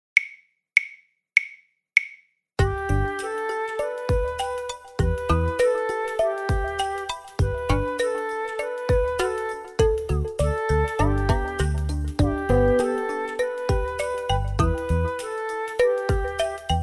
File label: Full Performance